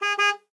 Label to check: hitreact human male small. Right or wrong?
Wrong